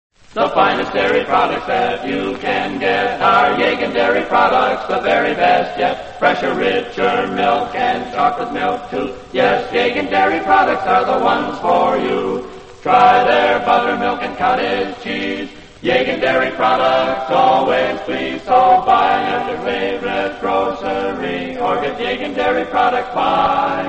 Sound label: radio jingles; yegen dairy; allied radio artists